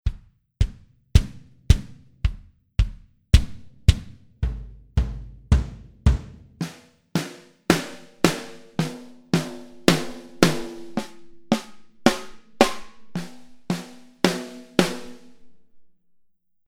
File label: KICKS AND SNARES